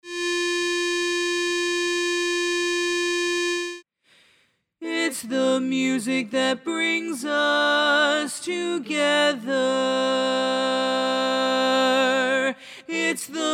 learning track bari dominant

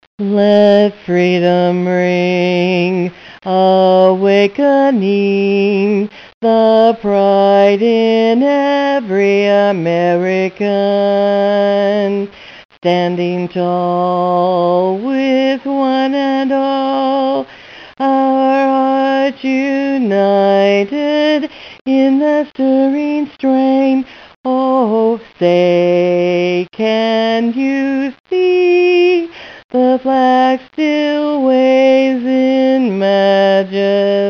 freedom bass alone

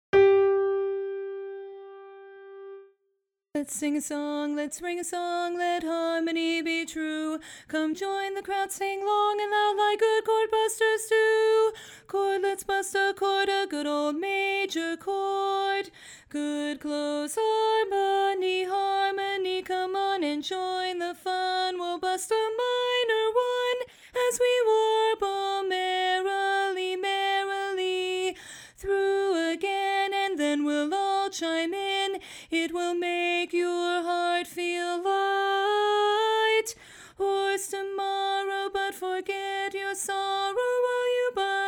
bari alone, chorus

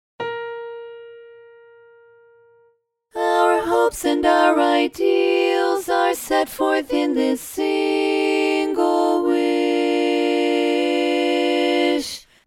learning track bass missing

